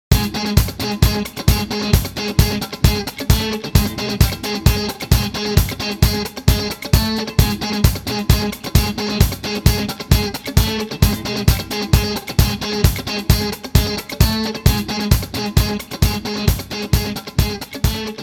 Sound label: instrumental version, Intro Loop; Persona 5; PS3; PS4